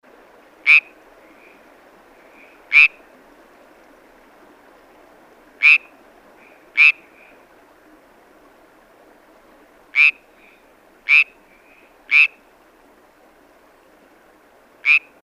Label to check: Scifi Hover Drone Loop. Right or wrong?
Wrong